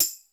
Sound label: PERC. COMBI; Crypton - DrumBase5000